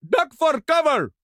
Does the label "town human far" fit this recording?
Yes